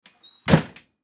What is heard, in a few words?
Ambulance Door Rear Close Squeak Interior; Emergency